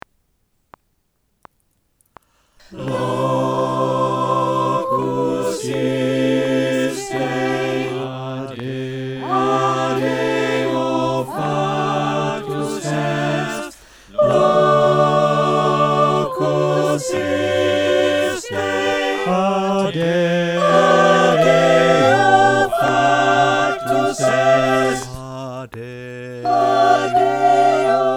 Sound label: SATB Mix, choir